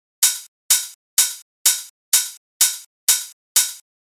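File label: Hi-hat Open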